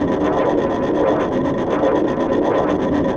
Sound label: GEIGER; Distored Reality